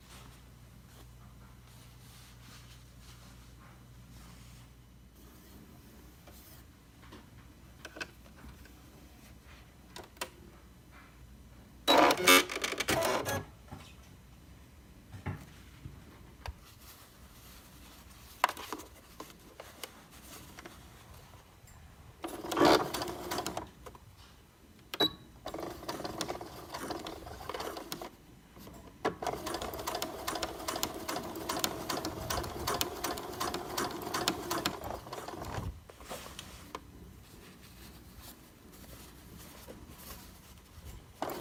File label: ASMR Sewing Machine